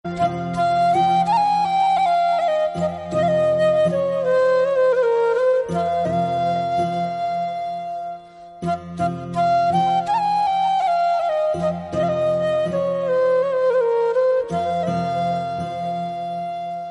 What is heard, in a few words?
flute